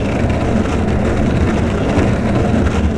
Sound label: roll glass, terrains; Tony Hawk's Project 8; XBOX